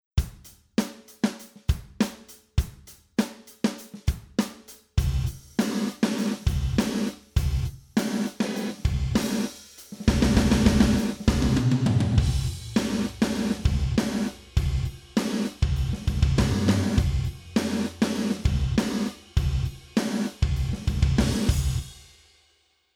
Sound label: DRUM Gated Rev